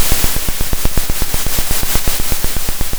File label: pop, Sound of a drum roll